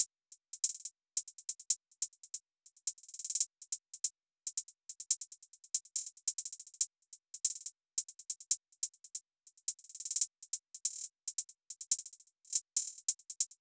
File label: Hihat Loop, 141 BPM, Drill; Hihat Loops; Cymatics; GHOST (Beta Pack)